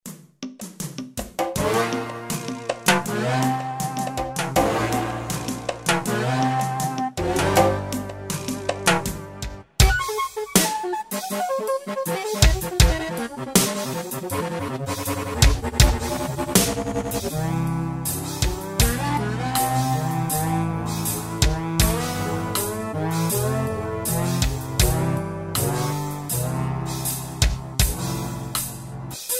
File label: flucello